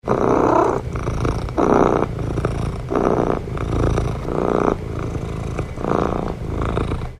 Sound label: cat